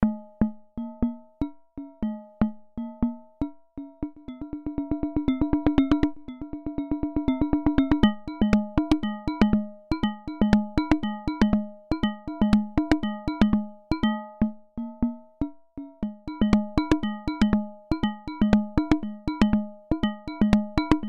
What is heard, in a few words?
hand drums Cymb